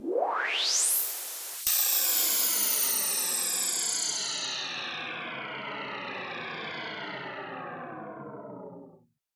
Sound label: 144 BPM Fx Sweep; Vengeance - Essential Clubsounds Vol.5; Flight Kit Root Amin